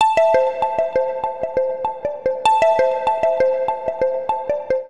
Oldbellz A Minor 98 bpm; MIDI Clips; Tonal; Melodic; Ableton; Factory Packs; Chop and Swing

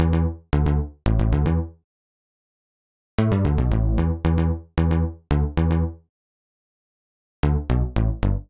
Midnight Bass C Major 113 bpm, Bassline; Tonal; MIDI Clips; Drive and Glow; Ableton; Factory Packs

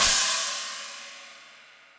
China Room With A Vista, Cymbal; Drums; Drum Hits; Standard Hits; Session Drums Studio

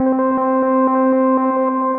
Atmos Pad; Synth Pad; Ableton; Factory Packs; Synth Essentials

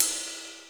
Ac Ride, Drums; Ableton Foundation FreePacks

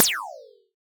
Alien FM Perc Short; Ableton; Noise Garden - Augmented Percussion; Alien FM Perc Vol. 1

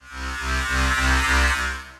Electrical Frigid, Ambient & Evolving; Ableton; Glitch and Wash

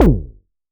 modular attacked, Kickbank; Ableton Foundation FreePacks

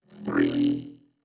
120 Neo-Wave Vocoder

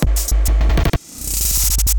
Beat Stutter; Inspired by Nature by Dillon Bastan; Vector Grain Presets

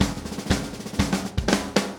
120 March Fast Drums; Pop Rock

